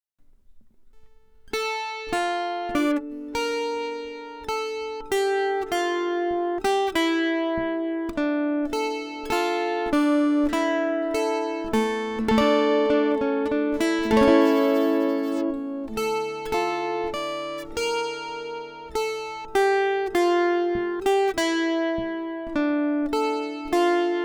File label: D100 Guitar; Corelli